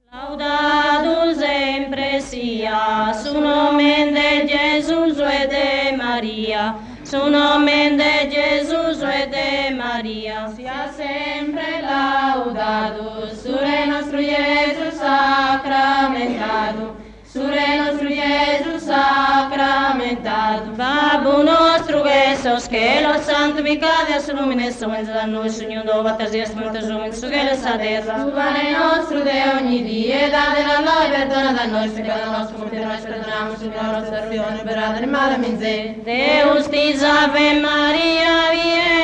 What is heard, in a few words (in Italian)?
polifonia a cuncordu, canto a tenore; archivio rai sardegna; musica strumentale